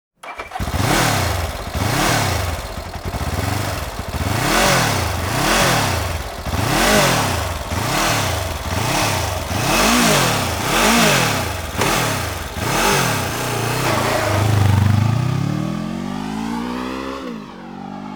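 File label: Laverda 750 S Formula, motorcycle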